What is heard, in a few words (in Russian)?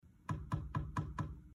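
стук в двери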